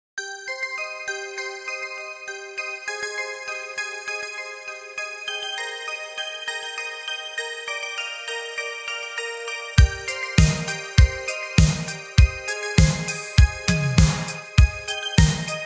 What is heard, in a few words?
italo disco